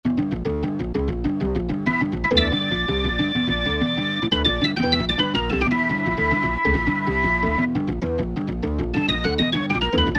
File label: Emo C, Alesis Z4 Vintage Keyboards